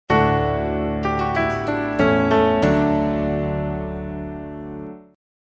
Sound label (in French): Melodie Clavier annonce fin